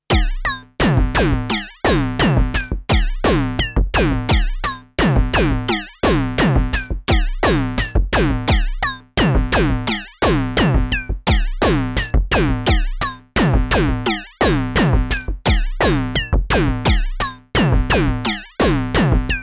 Trinity Neon Mode; Modbap Modular

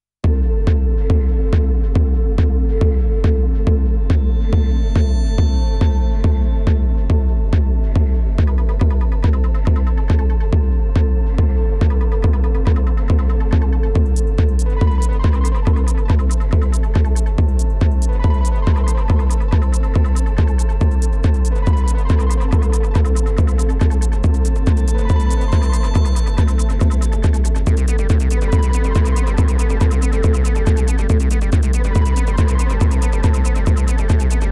Korg Kaoss Replay Sampling

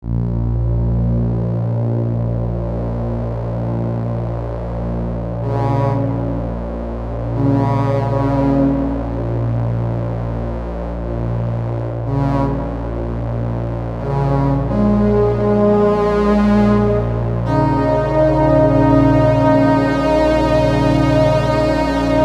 French Horns Section; Cherry Audio GX-80

